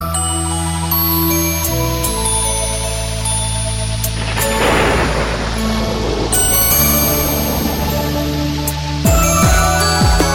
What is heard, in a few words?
nokia voice of rain